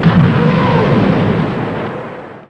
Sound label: nebel launch; garrysmod; gbombs